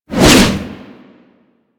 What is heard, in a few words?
Hammer Whoosh E; Warframe; Weapons